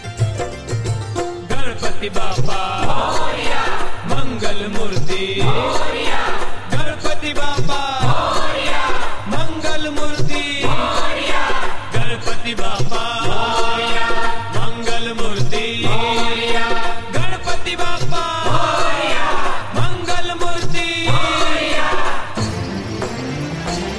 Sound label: Ganpati Bapa Moria Mangal Murti Moriya Hindi Religious; Religious Mp3 Ringtones; Devotional